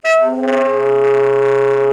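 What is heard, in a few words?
MULTIPHONIC, TENOR SAX; Northstar - New Gold VOL-1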